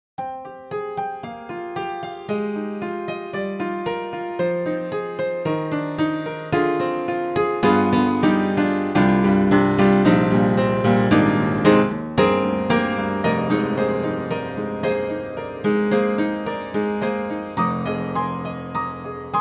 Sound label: Upright Pianos Vintage Upright 1914 Rock Upright; Synthogy; Ivory II